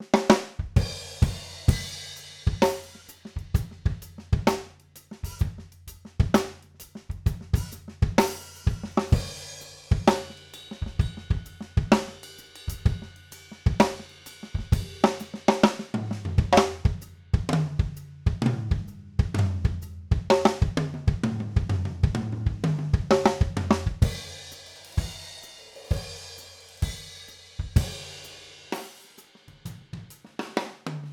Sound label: OH Mono Card, overheads, drum; drum shootout